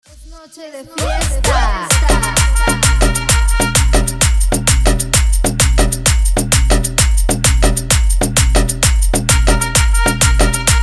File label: The Circuit Style Original Mix BPM 130